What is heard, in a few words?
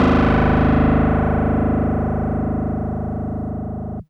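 Fuzz Clav DX100 G#0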